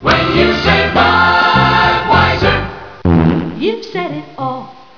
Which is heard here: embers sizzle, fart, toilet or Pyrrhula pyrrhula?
fart